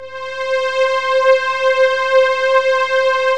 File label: STRING, Techno Trance, Synths & Keys; E-MU Formula 4000 Series Vol. 2